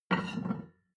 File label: plate on plate take medium, restaurant cafe